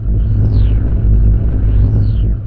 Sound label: deep down low distorto loop; LOOPING AMBIENCE